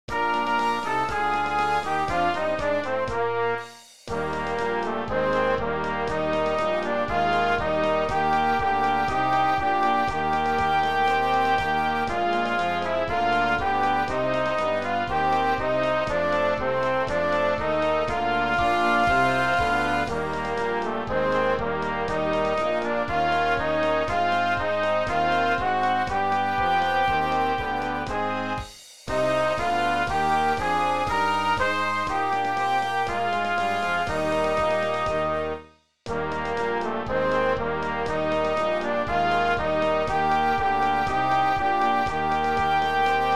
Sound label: on wisconsin playback